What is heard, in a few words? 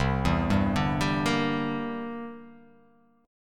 C 9th C down; C9 arpeggiated